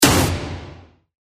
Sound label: snare; bloodbath